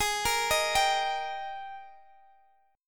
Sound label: G sharp Major 7th Suspended 2nd, Guitar, Standard; G#M7sus2; arpeggiated; x,x,18,15,16,15; down; capo=3